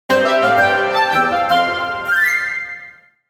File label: Game achievement success; gfxsounds